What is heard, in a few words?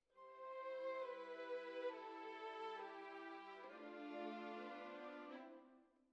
Violins Over